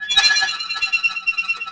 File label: METAL SQ; Rarefaction - Poke in The Ear With A Sharp Stick